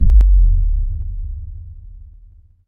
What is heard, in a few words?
transition impact hit